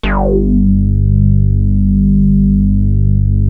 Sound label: JUNO 60 G2; Hollywood Edge - Giorgio Moroder Rare Synthesizer Collection